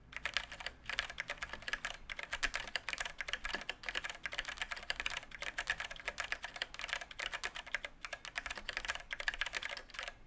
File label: cherry mx brown; brown blue switches